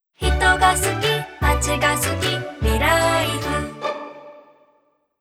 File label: soundlogo